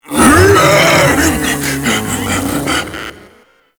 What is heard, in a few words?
Attack Long, Vocals; Enemies; Warframe; Proto Infested; Ape Prelate